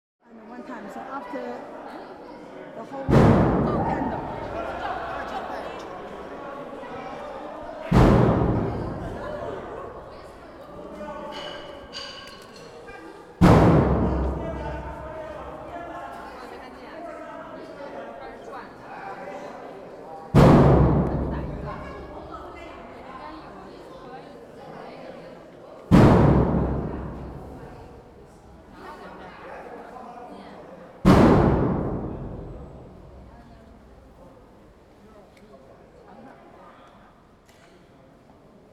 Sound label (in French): Clochers du tambour